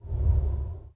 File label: WIND, wind